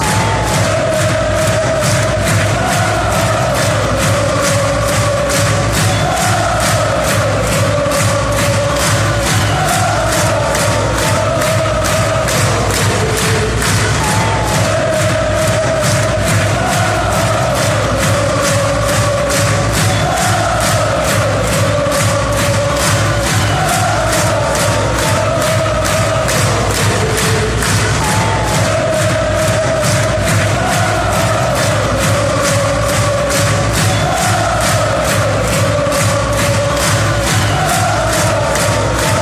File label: lalala; brazil